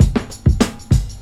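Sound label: tambourine, Boombap Drum loops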